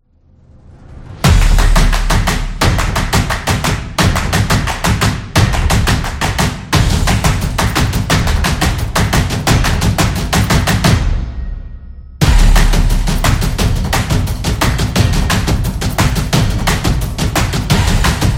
Stomp Percussion

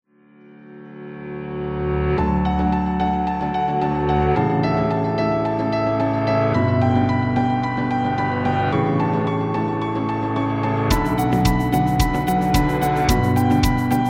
Asphalt Jungle, corporate music; corporate music vol 6

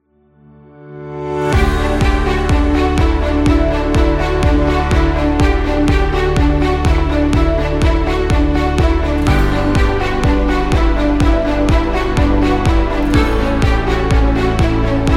Energetic Corporate

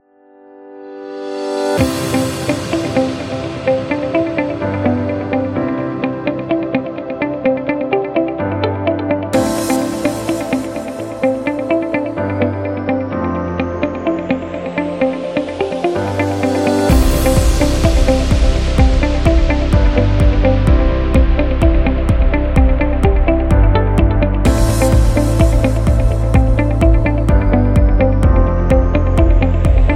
Corporate Upbeat

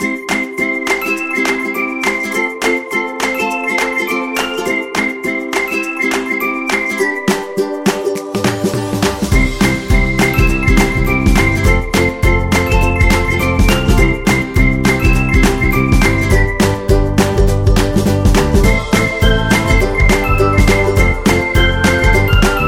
Happy Summer Fun, happy music

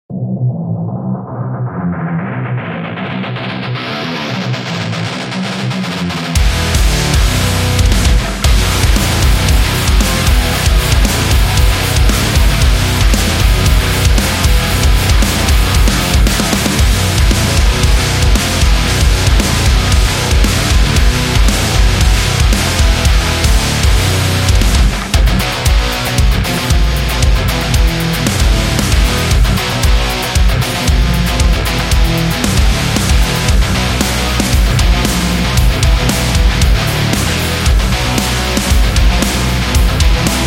Metal Heavy

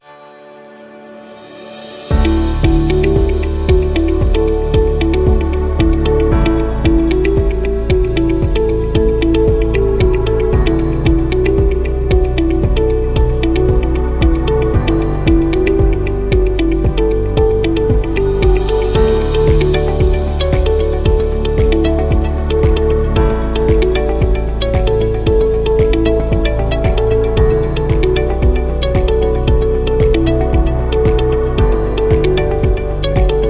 Inspiration Corporate

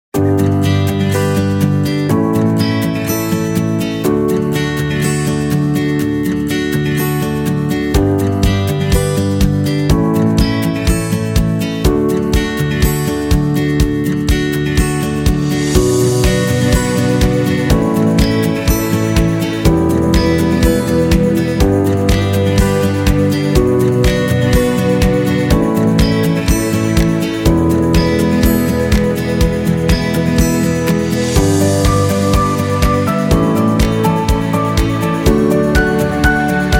Acoustic Guitar Folk; happy music vol 8

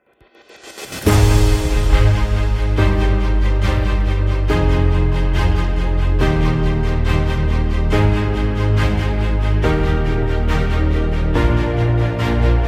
Orchestra Energy; epic sport metal

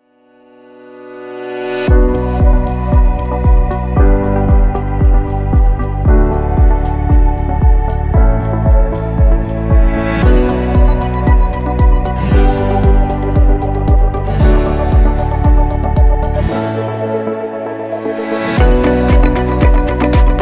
Health Medical; agency promo

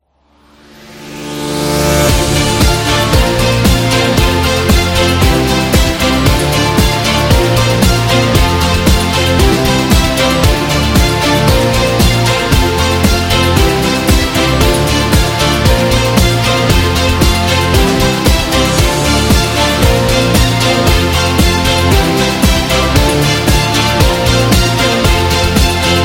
Corporate Inspiration

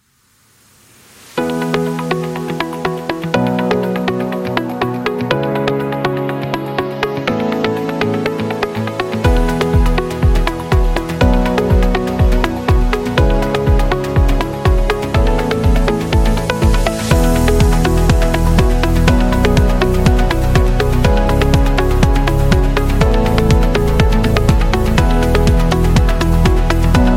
Corporate Intro

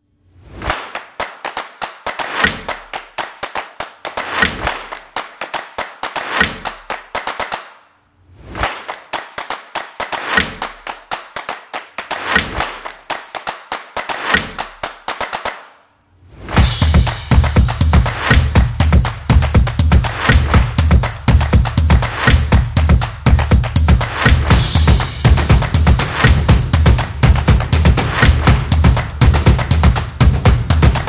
clapping snaps drums; percussive nation vol 3

